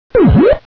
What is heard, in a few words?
pac bonus; tfc